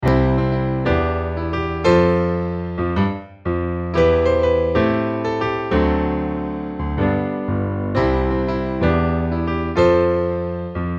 Theme On Piano; here comes niko original game soundtrack 2021

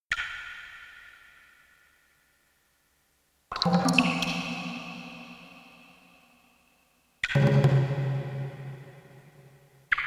Ambience (CD Audio); Alone in the Dark; MS-DOS

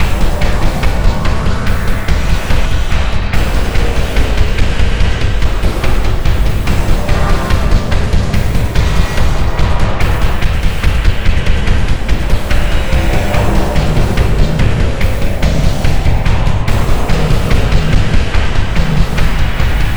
Boss Freak Out Theme; Insanely Twisted Shadow Planet

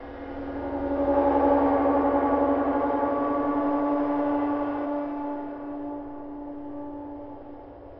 Combat Drone; The Sinking City

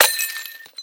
Sliced Hotsauce; boomerang fu

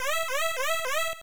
Warning

